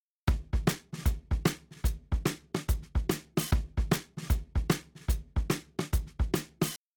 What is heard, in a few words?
Drums, shuffle drums; WDR2